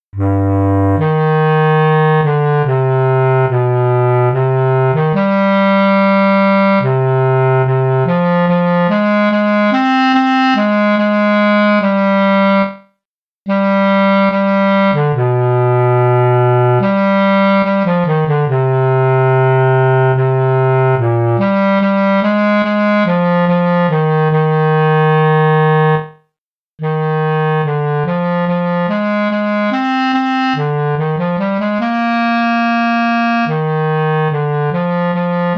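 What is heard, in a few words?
bass clarinet slow; BASS CLARINET Lyrical; Region 23 Middle School Etudes for 2026-27 (Year C)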